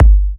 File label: G, kicks; markus hakala kicks